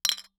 Impact Glass Bottle Clanks

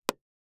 Foley Toy Hit Drum

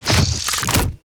transition shutter; Alexander Kopeikin; Transitions HD; GDC Game Audio Bundle

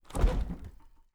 Freezer Door Opening; household

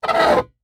Foley Metal Scrape On Concrete

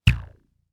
Weapon Bow Recoil Elastic; AdobeCC

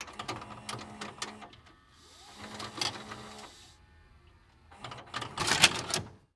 Technology Electronic VCR Eject Tape

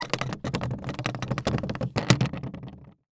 Suitcase Handle Rattle, Household

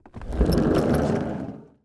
Household Luggage Roll By Short

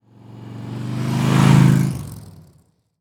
Auto Motorcycle Drive By Slow; Transportation

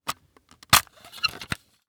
Technology Electronic Cassette Case Open

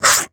Multimedia Internet CD-Rom Flash Wipe Short

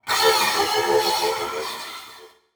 Transition Sweep Medium; Production Element

